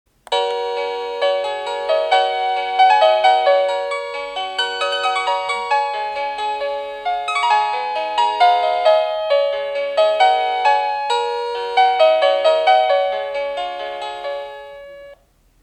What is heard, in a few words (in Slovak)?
Ukážka melódie zvonenia zvončeka